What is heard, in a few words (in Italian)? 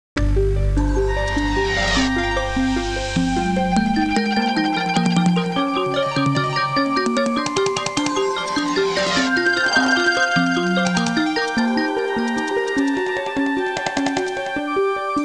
musica elettronica